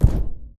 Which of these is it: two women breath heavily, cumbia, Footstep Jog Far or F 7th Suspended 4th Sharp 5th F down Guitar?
Footstep Jog Far